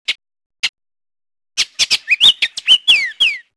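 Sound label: B oriole, Baltimore oriole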